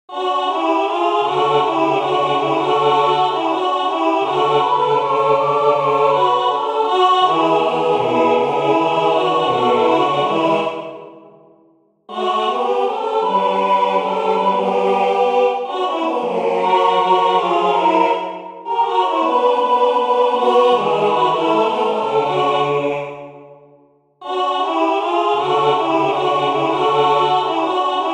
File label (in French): tutti